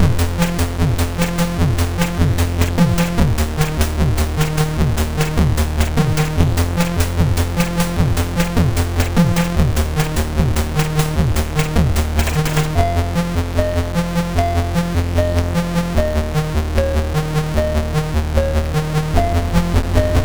MOS6581R4, sid; Rebels

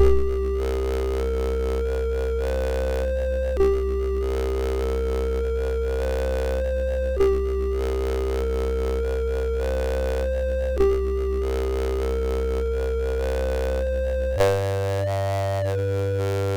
Spacey, MOS6581R3

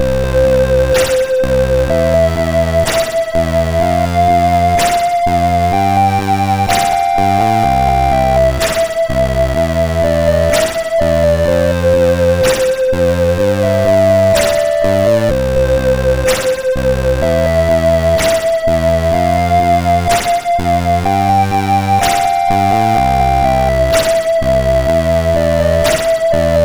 sid MOS6581R4; Arcade Pilot

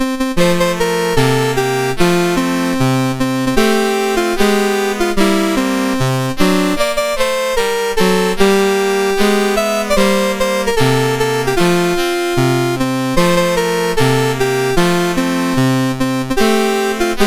MOS6581R2

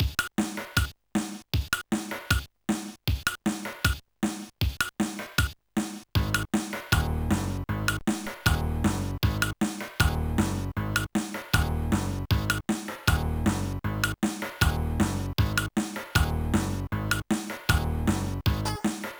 Neo Country, Dynamic Drums Song; Oakvalley

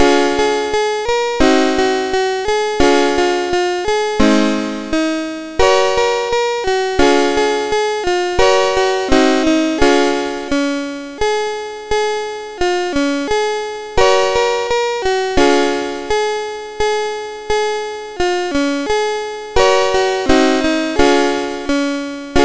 sid MOS6581R2

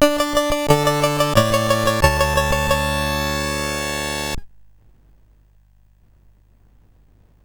sid CSG8580R5; Gyruss

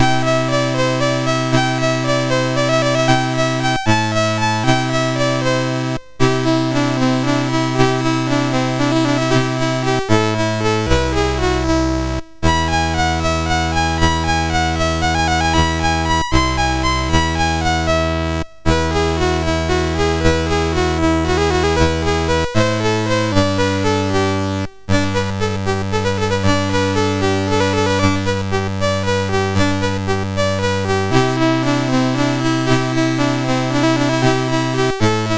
sid MOS6581; MOS6581R3; Morning Mood; Master Composer

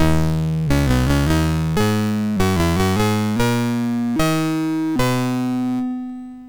sid MOS6581R3; Gumshoe

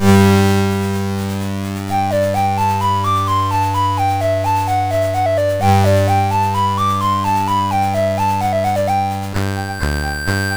Hoedown, MOS6581R4

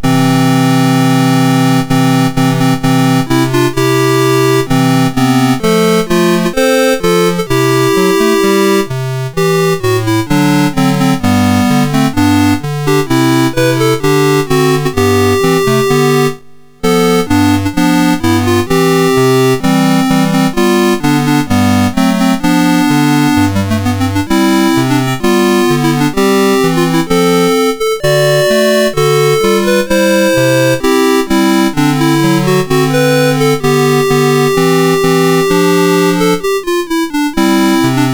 Open Gates MOS6581R2

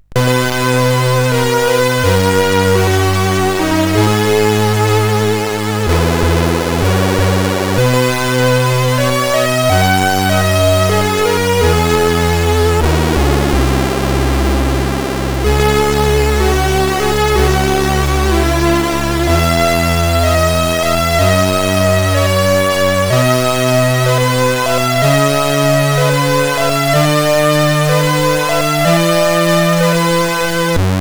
sid CSG8580R5

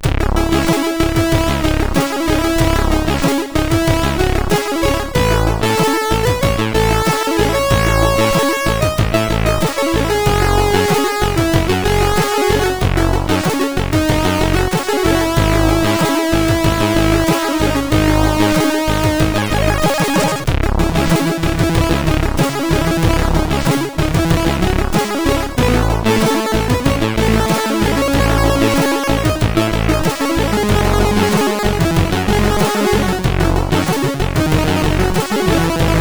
sid CSG8580R5

